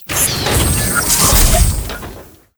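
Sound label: boss spawn, monster; steamer crazy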